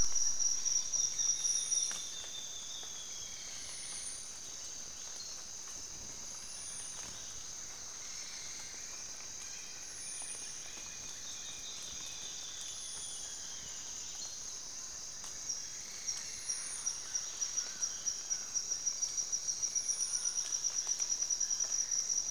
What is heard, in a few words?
Tropical dawn chorus Brazil